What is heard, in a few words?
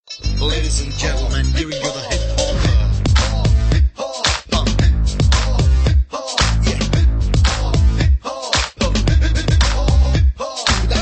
Hiphop